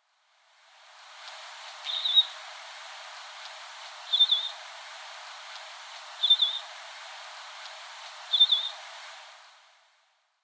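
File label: Pine Grosbeak; sapporo; koe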